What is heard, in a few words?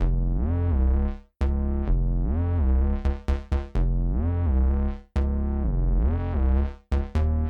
subsynth